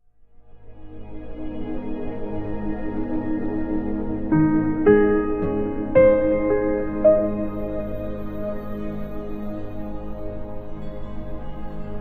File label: safe room loop